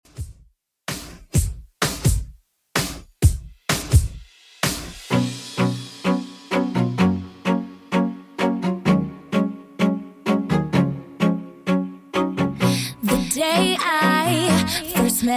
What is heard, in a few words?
2ks Redrum 128bpm CLEAN